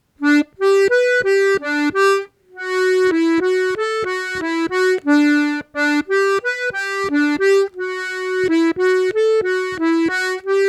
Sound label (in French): polka piquee